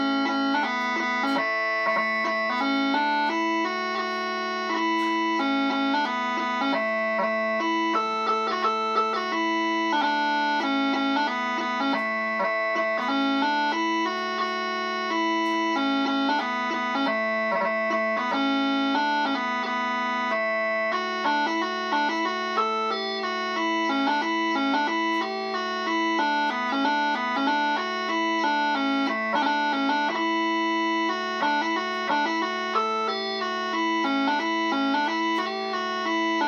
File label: Bagpipes; birthdays